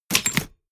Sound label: typewriter